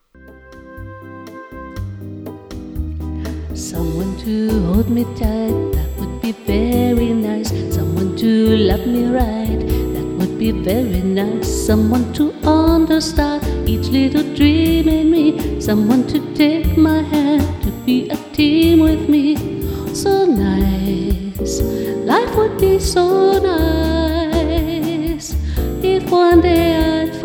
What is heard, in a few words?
Jazz